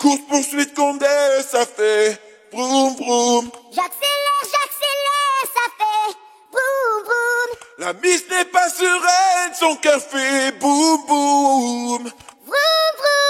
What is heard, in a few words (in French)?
vroum vroum